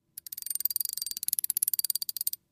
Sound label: Fishing; Reel Reels In And Casts Out Line, Various.